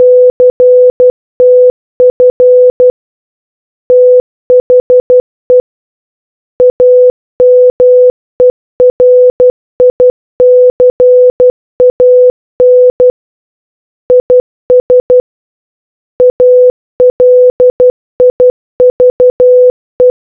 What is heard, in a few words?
taps; kamchatka; strangers ctf 2026